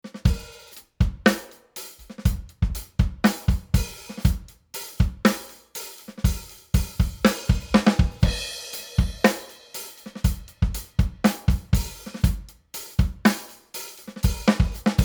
Compressor Drums ohne Kompressor; SSL G Series

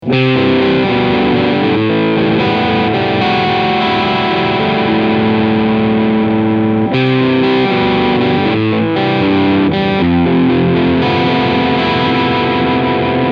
Harley Benton ST 20HSS Crunchsound Mitte und Steg Pickup

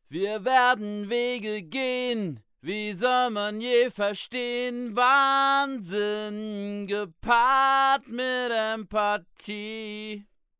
WA 47jr Vox Kugel

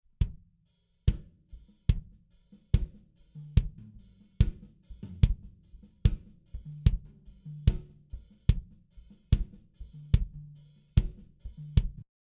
Bass Drum Roh

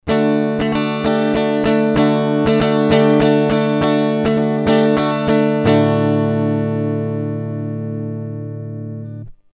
Behringer MIC300 gtr ohne

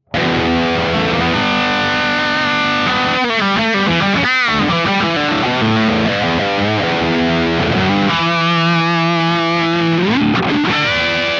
Digitale Gitarrensounds Orange Rockerverb 50 MK II; Kemper

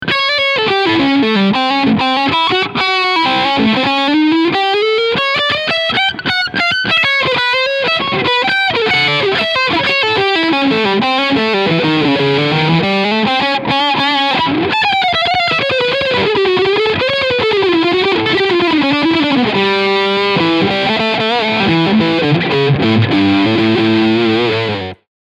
Fusion II HH Leadsound Steg Humbucker

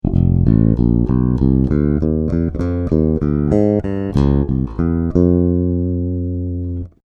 Corvette Steg Parallel

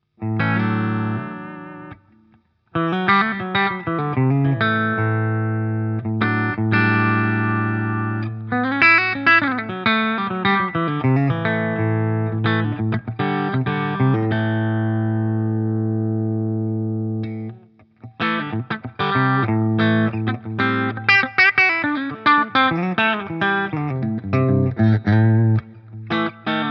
Yamaha Revstar RSE20 Steg clean Switch

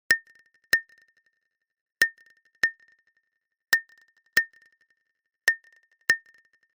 Woodblock hart

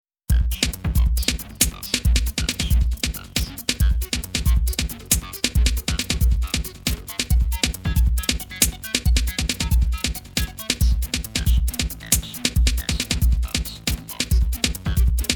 Drumgefrickel

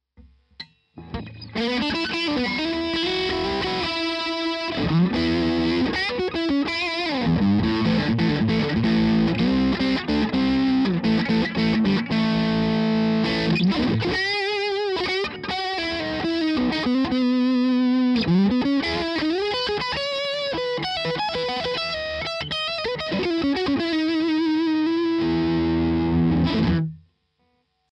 Beide PU Gain

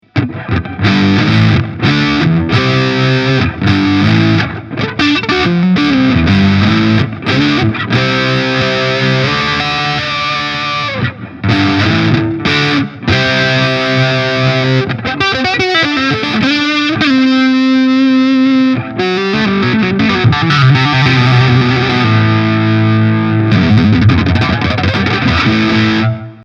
EVH Shark Hals

